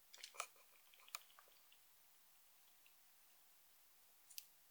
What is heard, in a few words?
Bechsteins